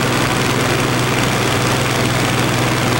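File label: Plane P40 Interior Inflight Loop; Airplanes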